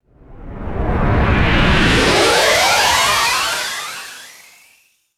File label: synthesized sci fi whoosh riser